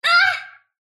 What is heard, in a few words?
sylph pain; dota; dark willow